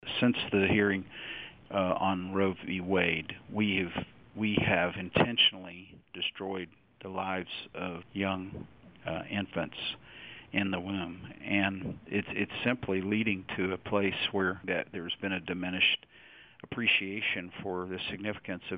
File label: HEARTBEAT